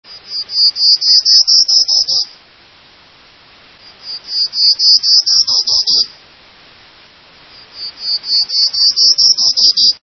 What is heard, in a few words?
Prairie Warbler